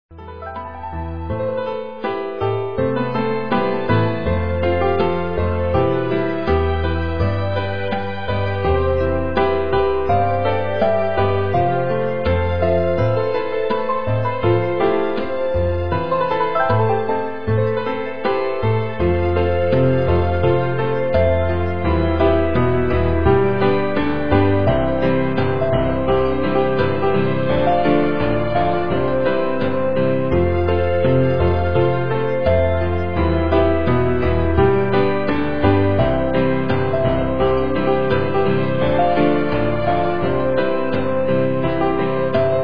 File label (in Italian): pianoforte virtuoso